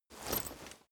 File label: leather metal walk normal single